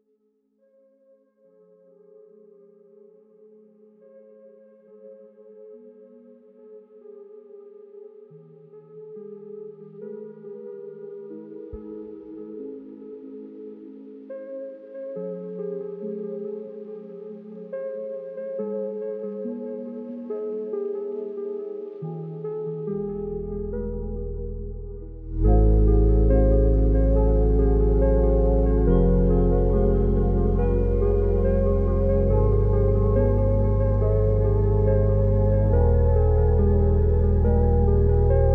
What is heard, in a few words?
wind gust